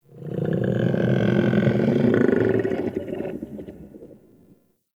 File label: Creature Growl; Warframe; Tau